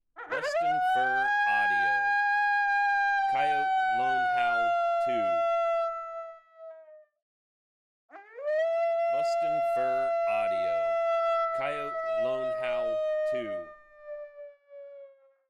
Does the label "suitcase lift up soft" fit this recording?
No